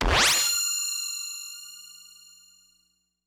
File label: ladder; wob2 demo